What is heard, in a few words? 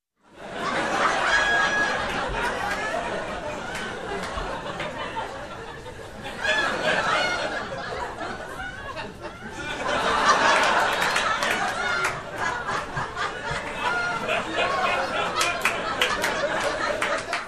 Group of people laughing